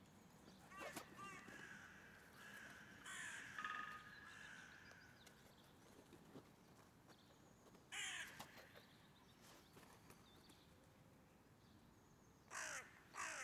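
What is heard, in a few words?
hooded crow corvus cornix cornix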